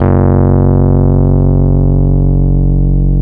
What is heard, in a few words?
F#0, Electric Pianos; Wizoo